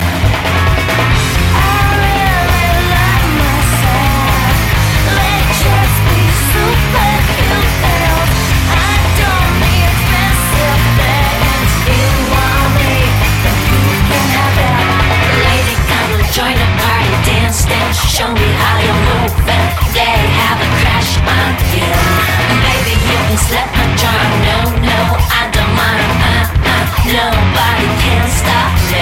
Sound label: "Ah-ah"